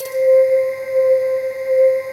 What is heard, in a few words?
DOO PADS; Pro-Rec - Synthsonic